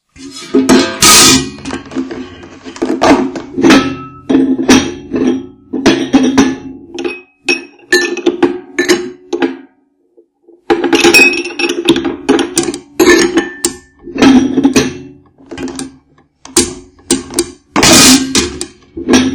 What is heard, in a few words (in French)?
rangement cuisine